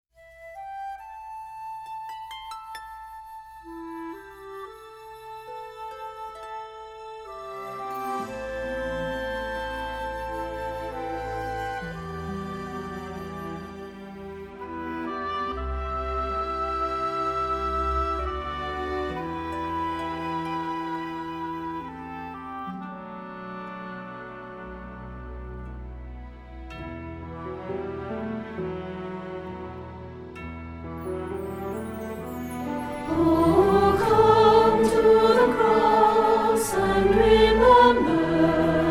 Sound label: Soprano